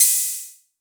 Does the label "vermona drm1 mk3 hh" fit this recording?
Yes